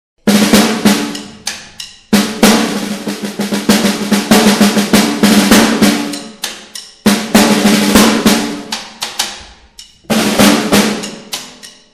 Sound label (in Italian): percussioni